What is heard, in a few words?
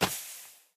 Quick Sand Bounce